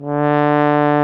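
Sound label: brass baritone; Roland - LA Composer vol. 1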